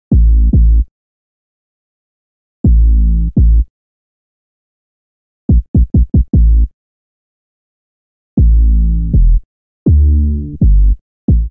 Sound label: A Minor 83 bpm, Bassline; MIDI Clips; Tonal; New Old School; Ableton; Factory Packs; Chop and Swing